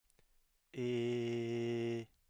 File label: mid-high front unrounded vowel